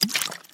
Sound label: Splash; ProbabilityGame